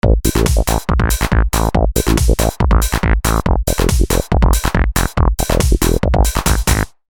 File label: TAL-Bassline